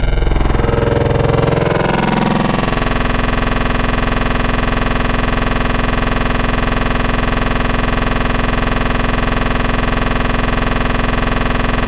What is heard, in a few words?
Digital Angry; Synthetic's Serum Collection; Echo Sound Works Core Tables